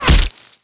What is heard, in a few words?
impact; Original TK Sounds